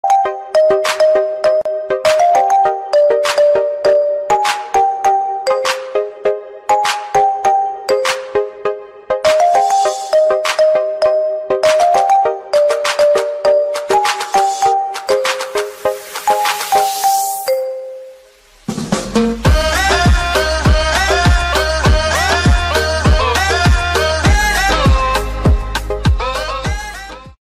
instrumental

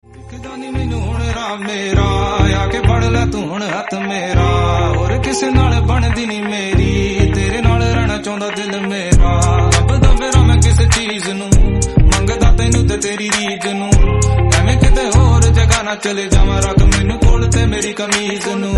ringtone

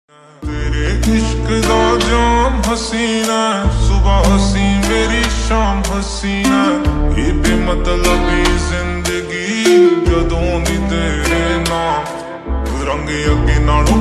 song instrumental ringtone

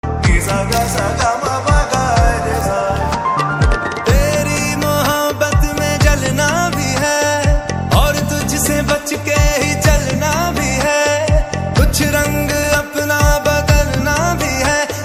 Sargam Notes Ringtone

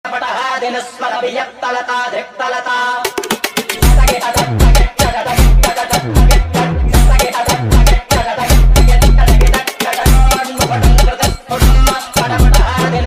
Shiv Mantra Ringtone

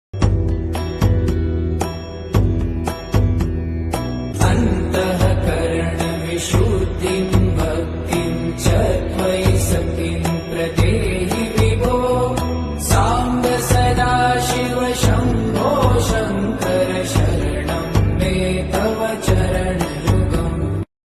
Shiv Stuti Ringtone, Shiv Bhajan

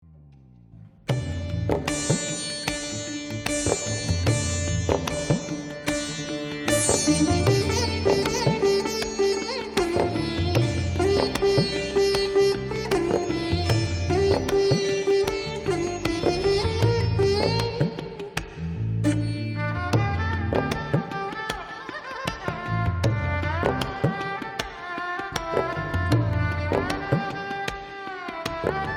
ringtone sitar

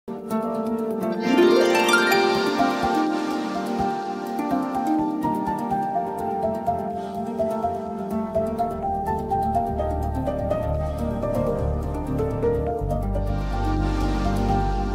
Female Ringtone Instrumental

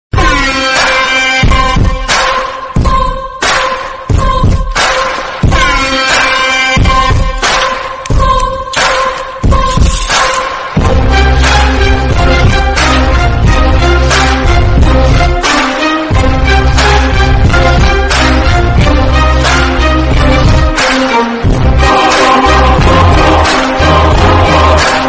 mankatha bgm ringtone

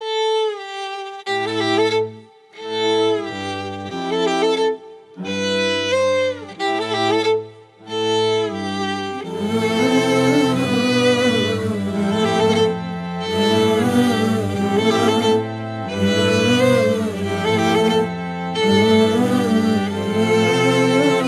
bgm ringtone

